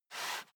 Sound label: step barefoot wood plank slide short; Footsteps; schritte